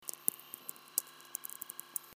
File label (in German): Feld Hinten, Natural Radio